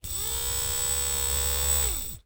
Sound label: Electronic Servo Motor Little Servo Motor; AdobeCC